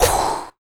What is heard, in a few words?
FEM PERC, Vocal Jazz FX